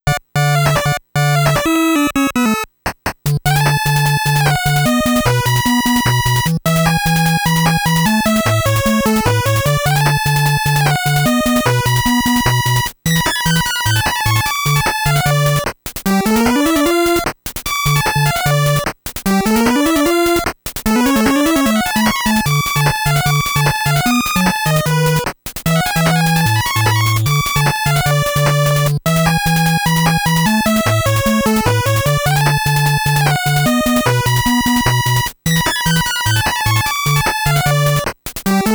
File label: Stage BGM; Ninja JaJaMaru Collection Original Soundtrack